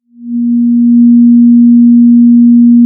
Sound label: Sound of a cruise ship horn